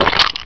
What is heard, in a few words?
Squish; ForsakenMark